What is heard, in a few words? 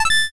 connect ok